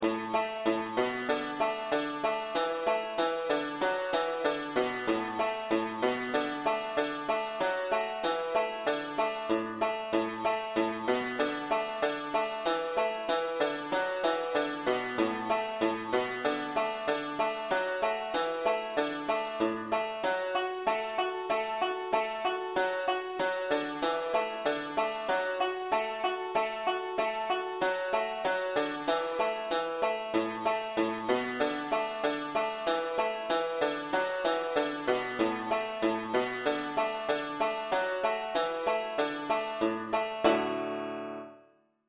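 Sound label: brethren we have met irish banjo d with melody; easy tabs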